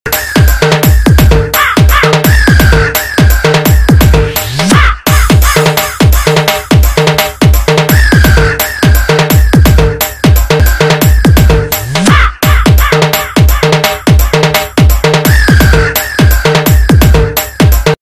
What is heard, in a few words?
crow song mix dj remix ringtone